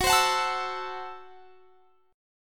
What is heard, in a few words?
F sharp Minor 7th Flat 5th F sharp; strummed; x,21,22,23,22,24